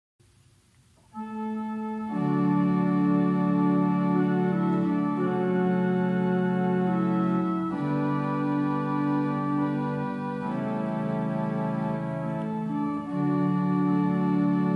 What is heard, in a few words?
Voix celeste; Löbejun